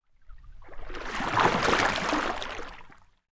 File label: Water; TOOLKIT vol.2